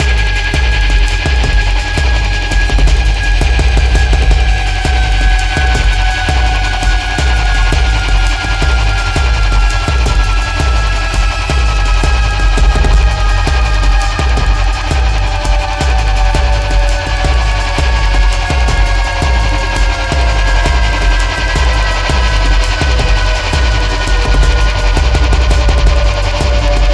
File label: Music Encounter High Pulse Drums And War; Battlefield 4